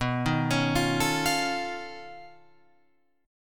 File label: B 9th Flat 5th B down Guitar Open F; B9b5 arpeggiated; JGuitar